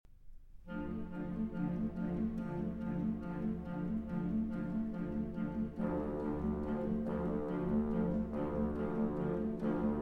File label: Concerto For Violin and Orchestra, For Saxophone, Crotchet = 150